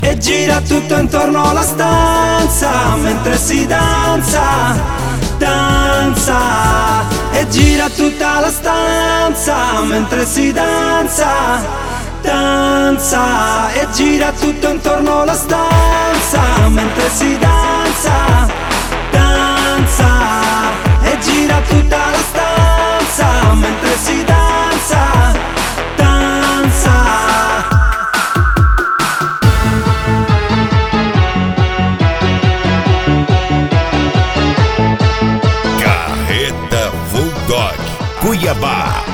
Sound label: flashback